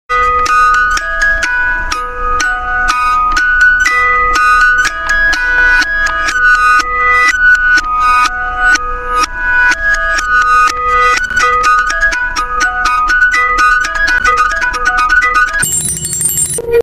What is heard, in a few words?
music box; fnaf